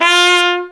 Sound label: cornet lips F4; AKAI S-Series CD-ROM Sound Library VOL-2